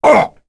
Damage, Vox; Phillop; kings raid; en

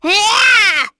Casting, Vox; voices; heroes; en; kingsraid

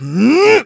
Vox Attack; Riheet; kingsraid

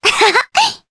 Laugh, jp; Cleo; kingsraid

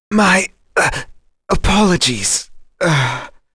Vox Dead; kingsraid; en

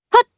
Vox Jump, jp; Chrisha; kingsraid

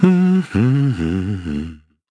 Vox Human Hum; Shakmeh; Kings Raid

